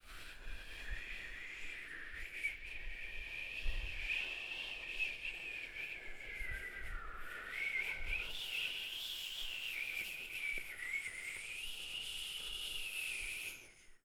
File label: Windy